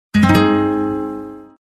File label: cute guitar short message tone; galaxy sms ringtones